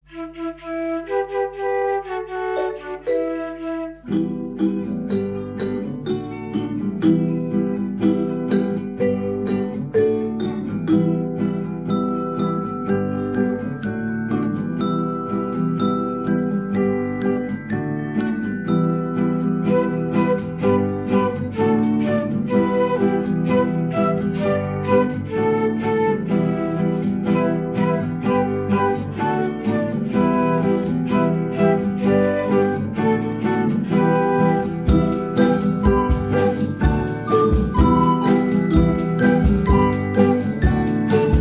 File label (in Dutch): rap roer instrumentaal